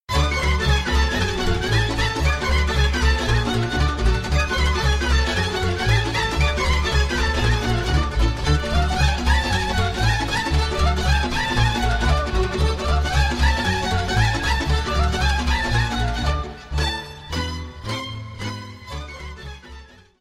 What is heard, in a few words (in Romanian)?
Sirba, Roumanie; Sirba Dogarilor 2